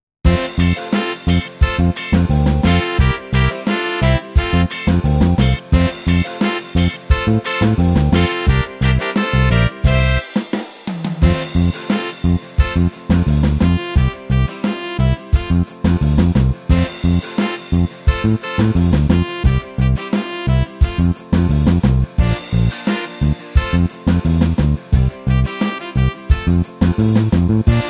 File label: Karaoke